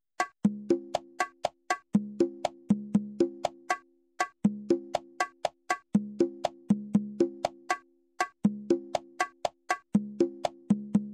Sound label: drum pattern